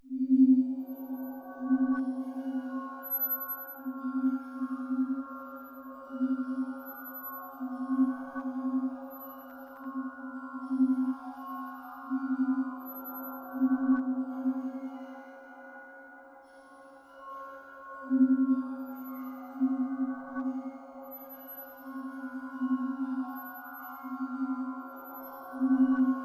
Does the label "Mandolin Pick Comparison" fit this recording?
No